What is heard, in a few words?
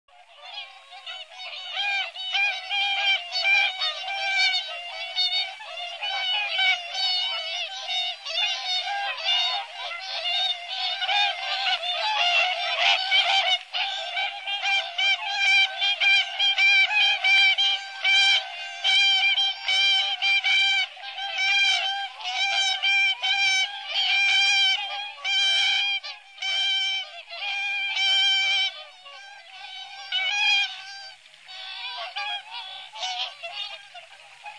Cygnus cygnus; Sakhalin; Kuril; sea; priroda sakhalina i kuril